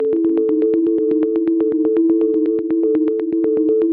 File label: 122 BPM Arps E maj C min Tonic A; arp essentials